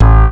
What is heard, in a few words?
D.B. Bass One Shot (A)